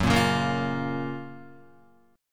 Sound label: F sharp Major 7th, Guitar; strummed; JGuitar